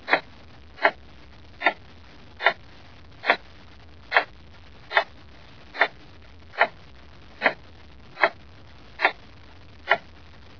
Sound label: clock tick